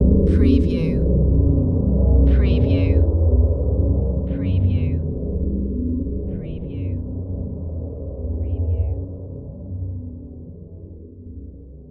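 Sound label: Production Element Hit Phaser; Sound Effects Bible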